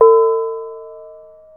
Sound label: kemong, gamelan; gravityharps